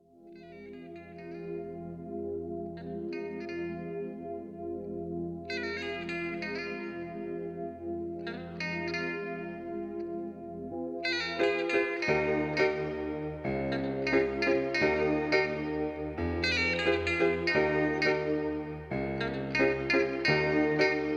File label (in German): Unser Vater